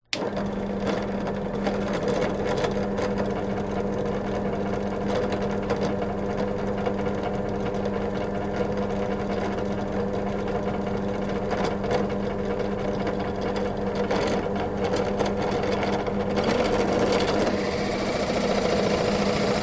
Old Belt Grinder; west wolf

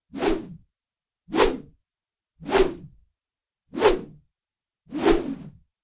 Large Bamboo Swing Fast; noise creations

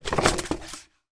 poster roll up fast; foley; sound effects; sound effects one

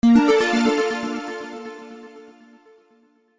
multimedia alert synth delayed warm

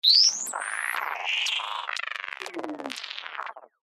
glitch digital; sound design

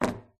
phone drops on wooden table; your free sounds

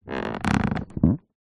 plastic creak bend stress; foley; zapsplat

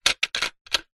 toy plastic gum ball dispenser handle turn

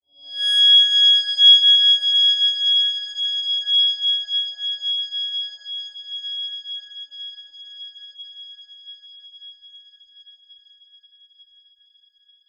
horror whine shrill high pitched; zapsplat

